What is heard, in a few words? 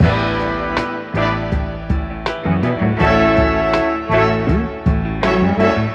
horns, phonky loops